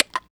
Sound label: PERC